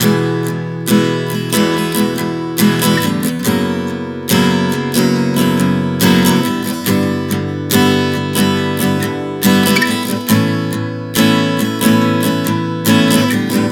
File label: Progression 070 E-F#-G-A, Acoustic Guitar, Chord Progressions 070 bpm; Sonic Foundry; Songwriter Acoustic Guitar Companion